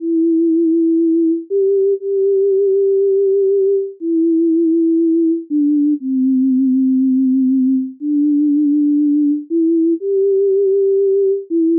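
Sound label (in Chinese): vibrato, 自然抖音 之 模擬; Csound orc; Csound orc 製作: 古鴻炎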